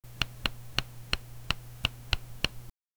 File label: picking up one card acous, quiet